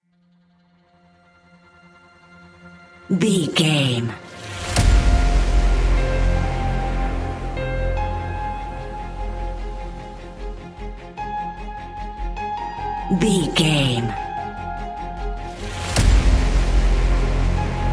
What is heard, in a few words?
action hybrid trailer